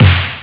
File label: snare